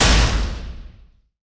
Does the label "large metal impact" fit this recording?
Yes